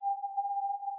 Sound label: CW QRM